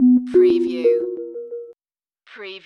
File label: Hip Hop Synth Licks 90 BPM - B - Straight